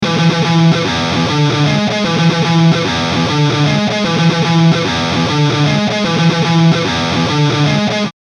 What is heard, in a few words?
NECK, eclipse active, 5150